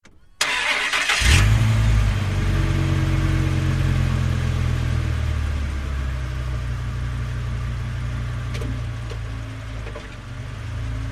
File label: Dodge Ram Start Idle M